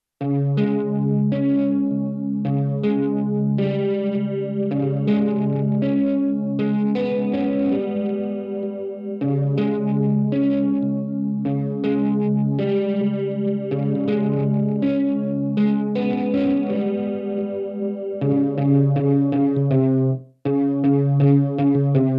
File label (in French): heavy guitare